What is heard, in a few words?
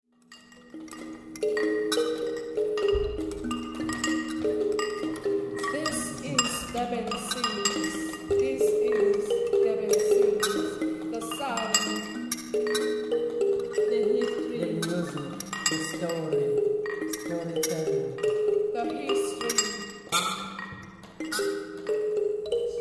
jingle african roots